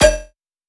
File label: Bass Stab Stinger F